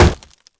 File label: Wood Shield Hit; Civ4 warlords